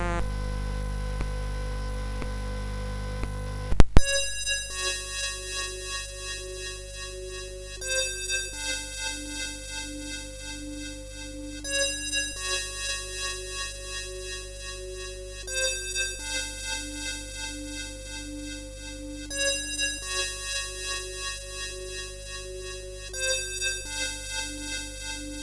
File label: 8580R5; sid; CSG8580R5